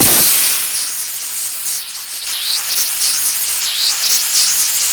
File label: gasping